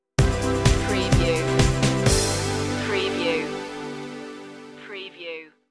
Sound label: ultraviolet stinger